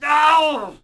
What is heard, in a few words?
Death Vox; Civ4 warlords